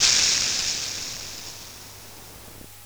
G#4 Shower Short, SFX Kit; Yamaha PSR-E323; drum machine

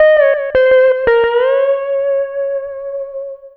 Lap Steel, Trip-Hop Loops; Sonic Foundry (Sony Creative Software); Rondo Brothers Trip-Hop Loops & Samples; Hard Rocks The Roof

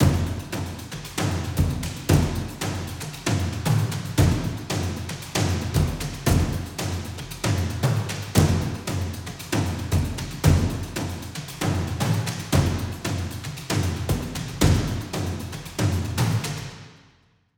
perc loop toms; 15bpm; M8; percussion and foley

